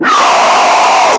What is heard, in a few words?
Screamer Death; Warframe; Lasrian